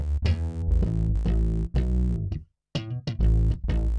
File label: bass; musdb18